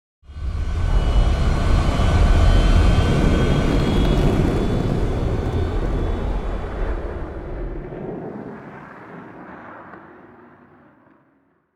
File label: Cargo spaceship landing